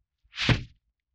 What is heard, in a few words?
Swish Broom Hit, Vintage Cartoon